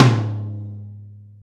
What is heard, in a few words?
gretsch tom, deep house single hits